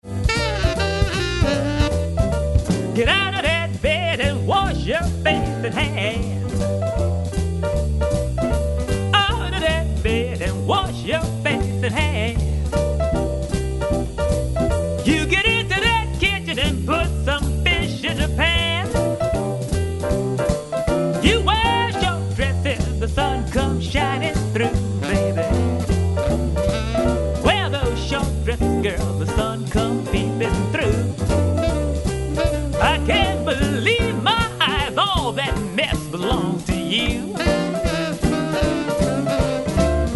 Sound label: shake rattle